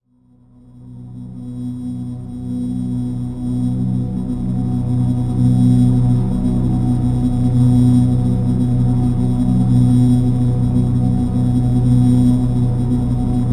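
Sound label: drone; Neutrality B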